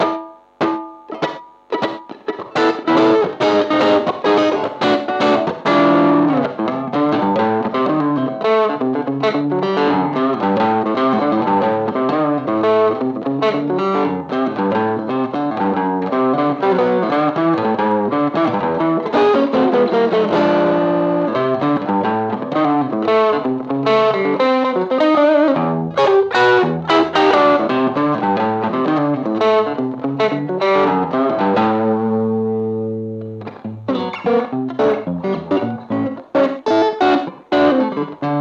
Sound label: jazz boogie; gx700